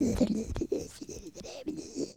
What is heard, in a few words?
BUBBLE; SHOUTS; Best Service; Hyper Dance